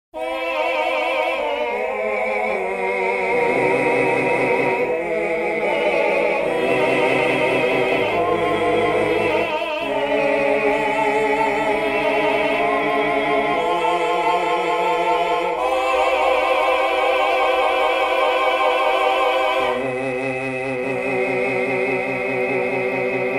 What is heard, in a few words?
solo male